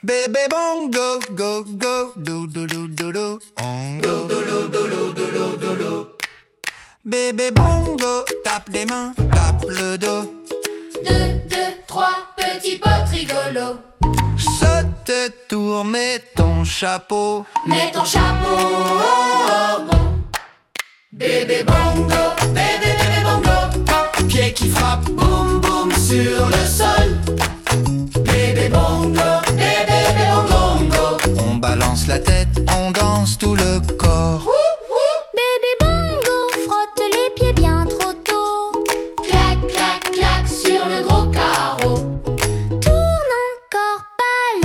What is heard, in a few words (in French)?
comptine rythmique; bebe bongo et les bottes